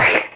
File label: Gag; Lewd SFX